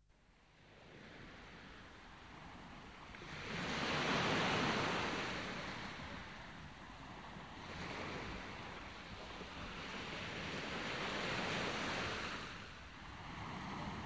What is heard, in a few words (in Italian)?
MARE RISACCA SPIAGGIA